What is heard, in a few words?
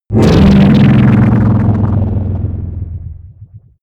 energy shield down; energie